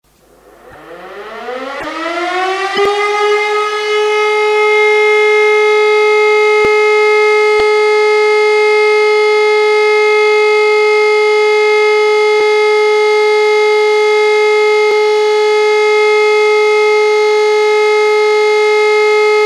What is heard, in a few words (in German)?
Entwarnung Dauerton; Entwarnung Audio